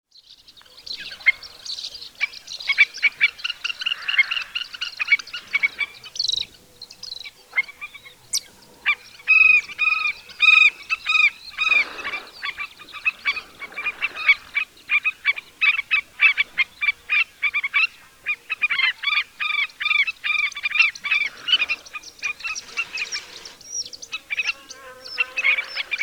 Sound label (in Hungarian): afrikai olloscsorumadar hangja